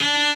cello

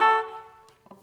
Key Basoon; Luxembourg Zwee

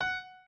piano

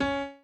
piano chord

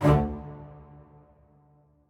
strings

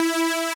lead o5e

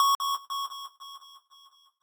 lead

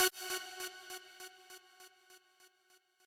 lead

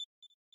arp

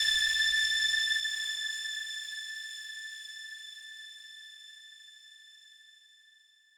lead3

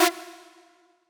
synth

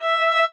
Vl (D)

